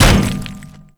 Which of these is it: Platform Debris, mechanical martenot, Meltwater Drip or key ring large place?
Platform Debris